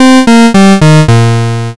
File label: gameover